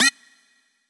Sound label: button